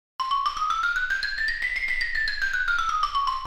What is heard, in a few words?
Cartoon comedy gliss up and down